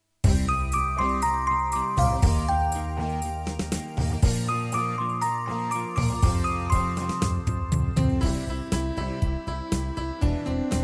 instr

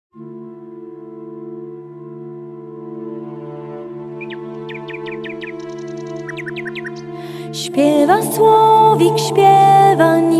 wersja wokalna; Teczowa Muzyka

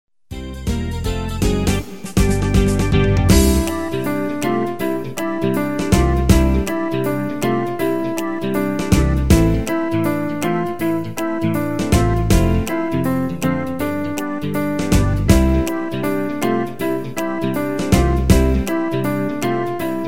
hej pada deszczyk instr

